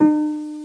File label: harp; My Science Fair